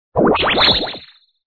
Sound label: event; Magic-Tower